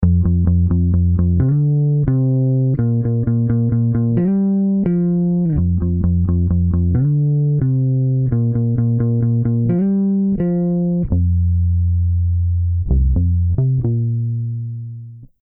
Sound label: Bass Distressor Smooth Compression; UAD Spark